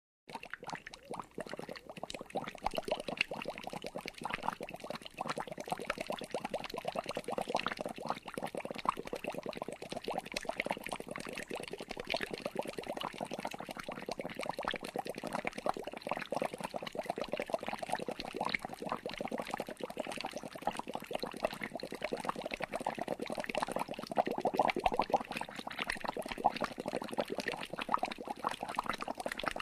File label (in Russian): Puzyrki na vode